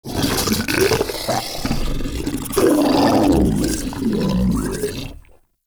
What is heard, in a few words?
Ancient Tank Idle, Enemies, Infested, Barks; Warframe